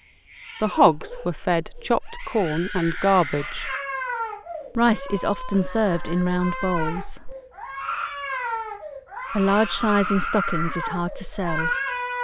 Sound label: Screaming kid original conditions